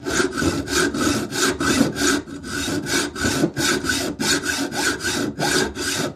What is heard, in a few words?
Saw Hack Cutting Met